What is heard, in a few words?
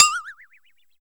STUPID BELL; TEK PERC2